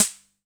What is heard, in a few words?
Wurlitzer Swinging Rhythm Snare